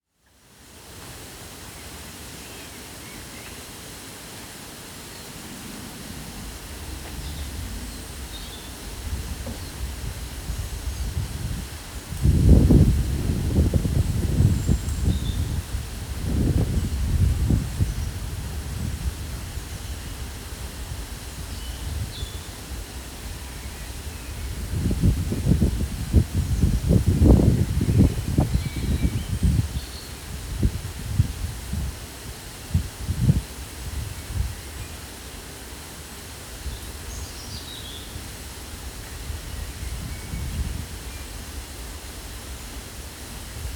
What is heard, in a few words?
Little Lock; Little Lock 67; At a lock on the Monmouthshire & Brecon canal. Water spilling from a lock gate.